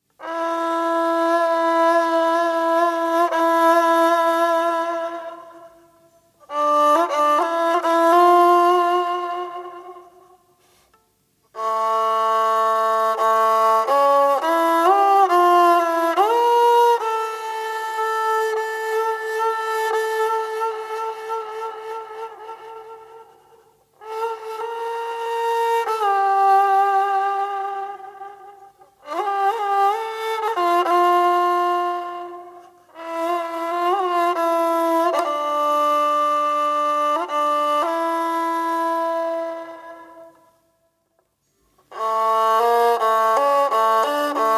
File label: polska